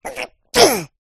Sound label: cartoon character sneeze; zapsplat